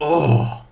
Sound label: Ohh